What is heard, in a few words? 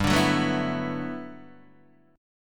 G Major 13th, Guitar DADGAD